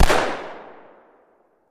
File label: Gun Shot Sngl Fire